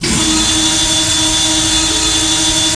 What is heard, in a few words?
door move